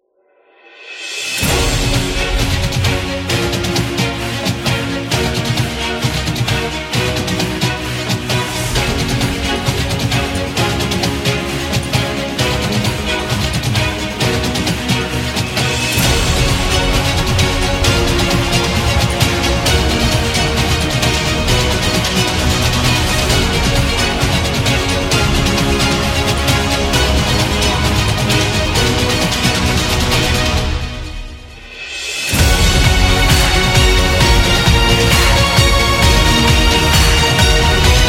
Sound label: Epic Sport